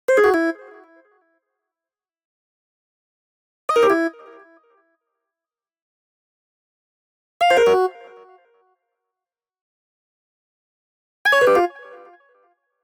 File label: Arcade Jingle Negative